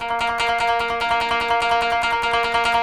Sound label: KOTO TREM